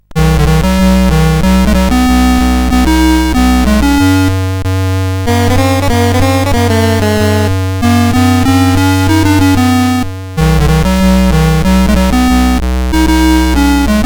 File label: sid, CSG8580R5